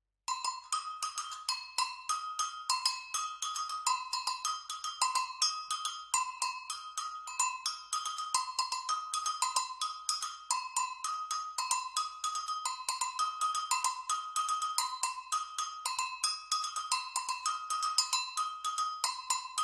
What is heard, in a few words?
agogo